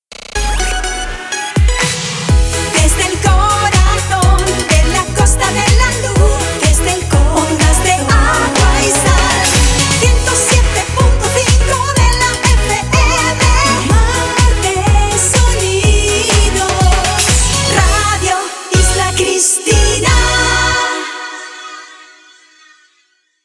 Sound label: dance